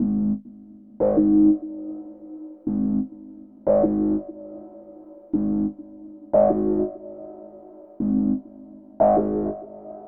Snake Bass; Zero-G Smoke

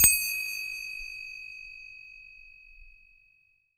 Indian Bell Hit Loop, Ethnic Instruments

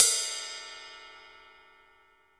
Drum Kit, DRY KIT

